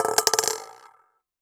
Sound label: Coin On Collander